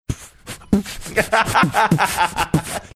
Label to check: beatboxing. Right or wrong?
Right